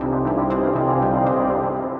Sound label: Melodic Arps; Flux State